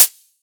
Hihat, Psytrance; One Shots

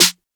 snare; Singomakers; Psytrance Mantra; Drum Shots

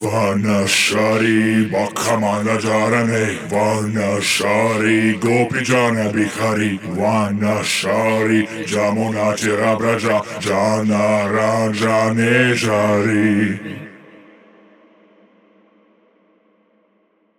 138 Shamanic Voice (Wet), Psytrance

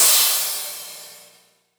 Crash, Cymbals; Minimal House

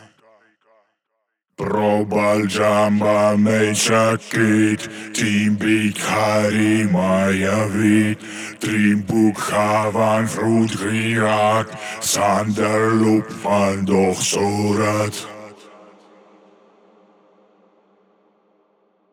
138 Shamanic Voice (Wet), Psytrance; Shamanic Voices

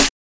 Snare, Psytrance; One Shots; Claps Snares

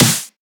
Snare, Psytrance; One Shots; Claps Snares

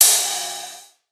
Ride, Cymbals, Drum Shots; Psytrance; Singomakers; Psytrance Mantra

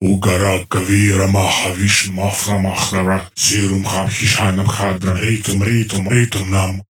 138 Shamanic Voice (Wet FX), Psytrance; Shamanic Voices

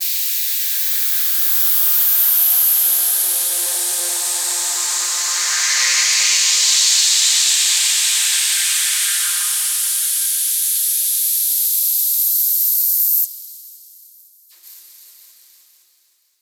Noise FX; Singomakers; Infected Psytrance

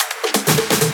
Minimal Fills, Minimal House; Minimal Loops

